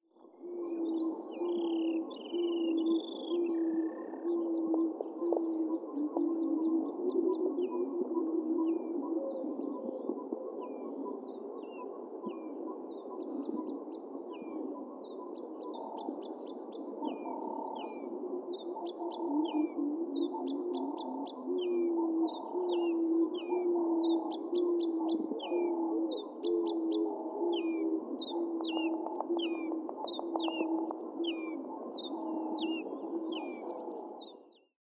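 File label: Columba unicincta, pigeon; kongolezskiy golub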